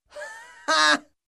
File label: Mocking Laugh